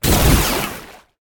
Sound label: basic fire; Smite; Sol